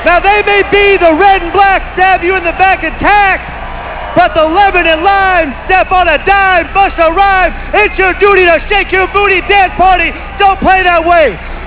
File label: disco